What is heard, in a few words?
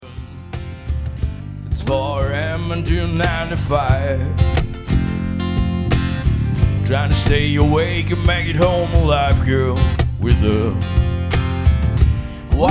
acoustic